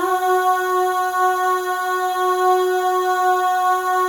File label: L AHS, Symphony of Voices, FEM; Spectrasonics; FEM.1 AHS